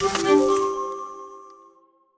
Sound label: New Item Fanfare; Sparklite